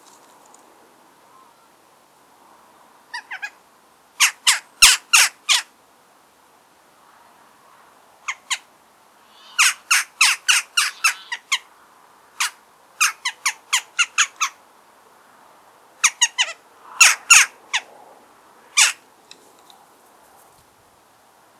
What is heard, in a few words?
Western Jackdaw Coloeus monedula